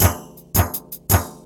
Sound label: Electro Fills